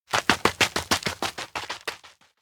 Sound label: steps outdoor grassland run away; ken; anemoi